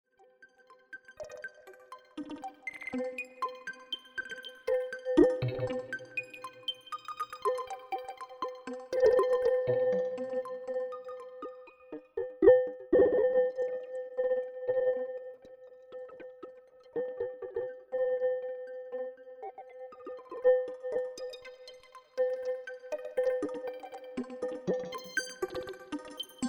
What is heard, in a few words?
Env Follower; Serge Crocodile